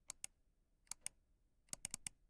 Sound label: Moving A Mouth; LOOPERS